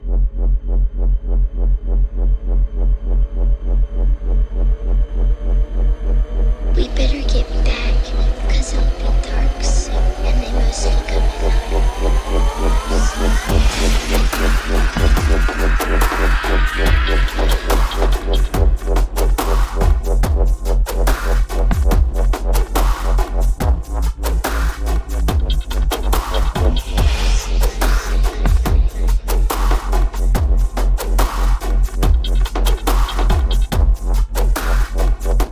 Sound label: DUBSTEP